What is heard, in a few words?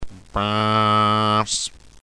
bass